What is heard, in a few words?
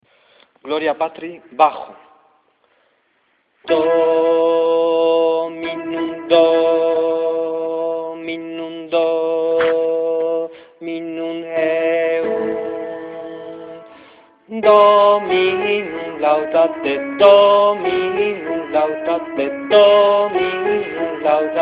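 bass; 3 motetes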